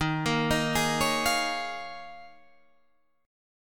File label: Eb 7th Suspended 2nd Suspended 4th Eb